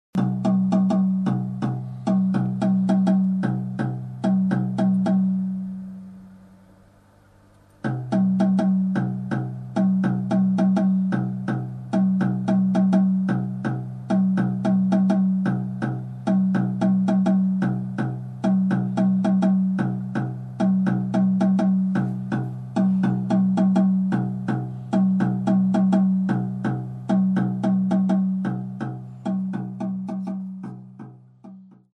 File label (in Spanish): escala mayor ritmica